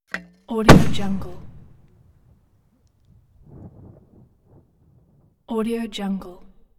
infantry mortar launch

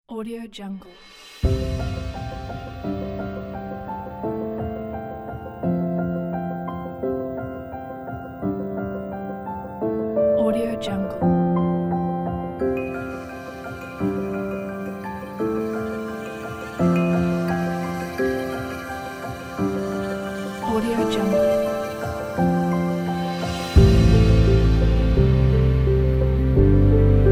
cinematic uplifting piano

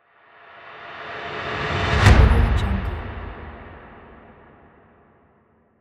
hitting transition whoosh

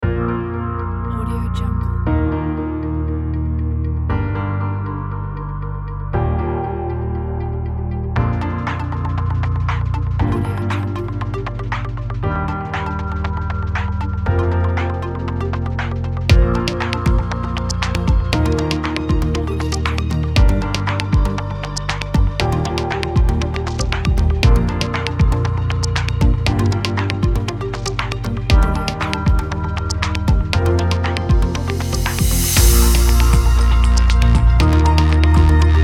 electronic acoustic design

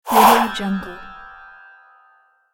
chime whoosh

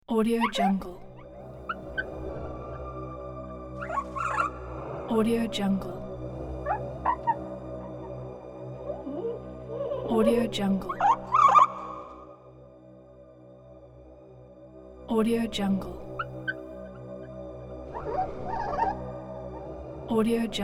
dark psychotic theme background

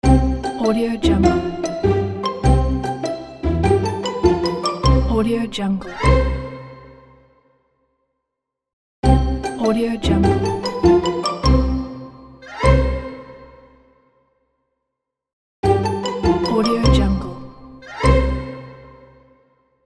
cartoon baby logo

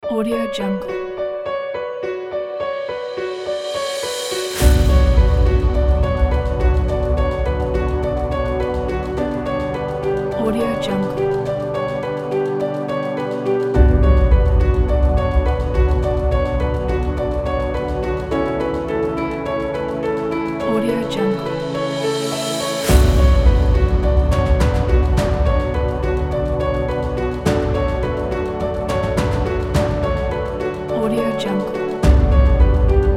piano epic kit

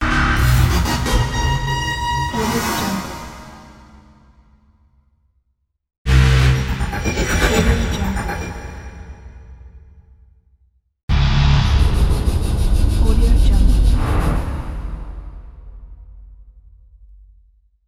dark horror

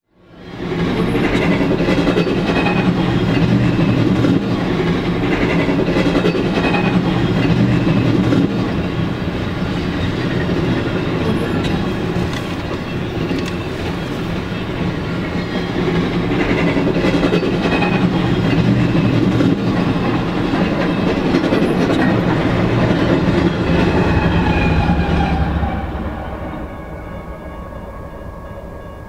train

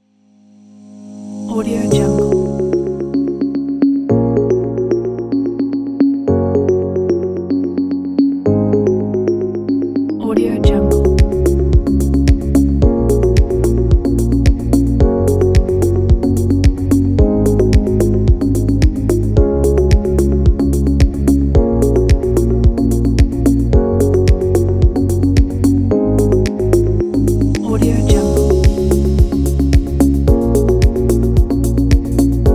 corporate ambient

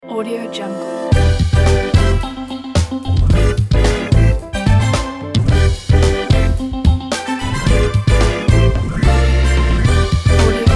funky gospel upbeat

